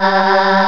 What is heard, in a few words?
CHOIR C3; GOTHIC; East West; Global Sounds